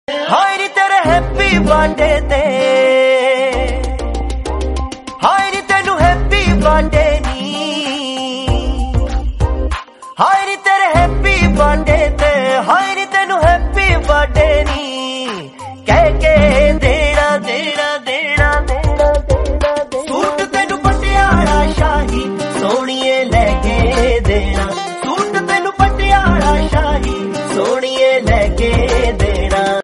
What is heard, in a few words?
happy birthday wish birthday coming soon birthday song